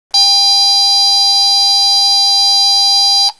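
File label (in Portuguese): high pitch, sinos; cidade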